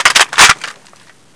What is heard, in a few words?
m4a1 clip in